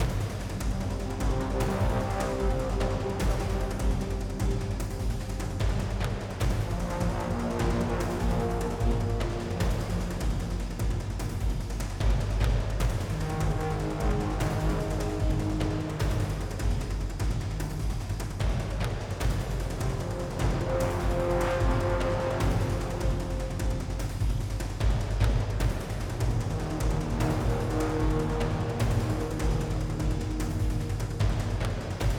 Waterways Action

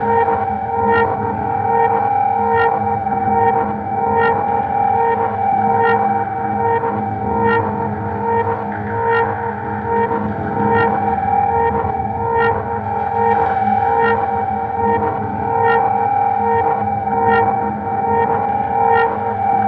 Intense Loop; Future Unfolding

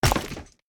pillar Hit; unsighted

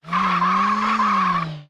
spider boss move medium

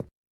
Obstacle Wardrobe Hat arrived; In Obstacle Wardrobe Hatarrived; hello kitty friends match; android

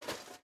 Mech Footstep Texture Movement; Starbites

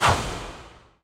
Dash; Hollow Knight (gamerip) (2018)

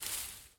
Footstep Foliage Bush-Big

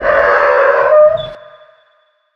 Monster Electrocuted; rampage arcade gamerip 2018; Monster Ralph

